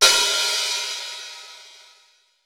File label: Folk Open HiHat